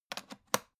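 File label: aluminium case small close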